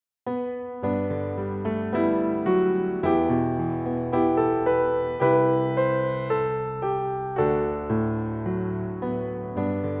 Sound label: Arise and Shine Forth piano only